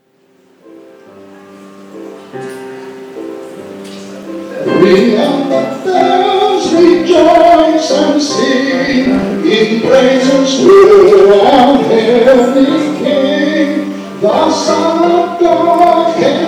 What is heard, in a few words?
BELLS; RING OUT THE BELLS